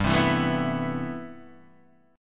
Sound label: C Major 13th G